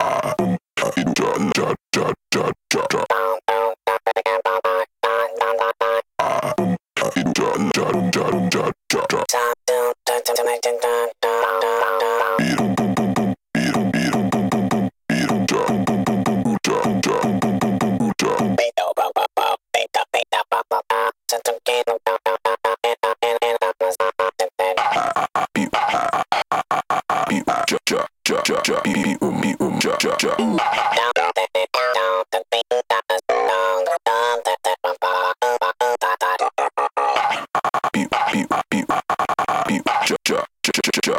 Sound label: Voices